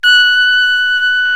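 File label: BRA TRUMPE, trumpet, brass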